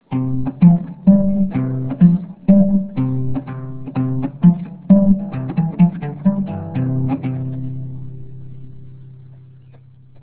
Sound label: bass viol; baltimore